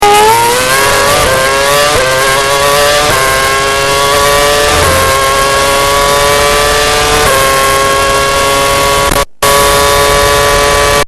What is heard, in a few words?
Acceleration, phonetones; Red Bull MOBILE